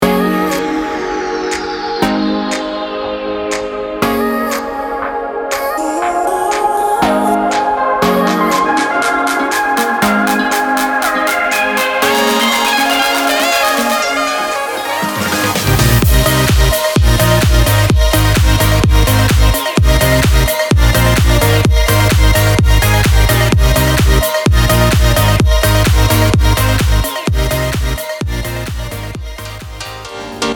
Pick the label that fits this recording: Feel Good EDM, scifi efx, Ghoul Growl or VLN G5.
Feel Good EDM